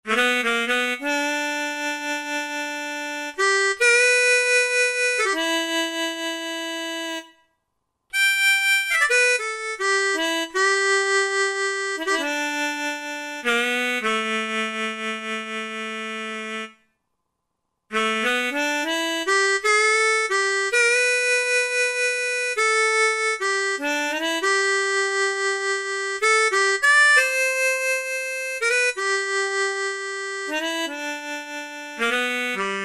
Country Harp, Roland SR-JV80-17 Country Collection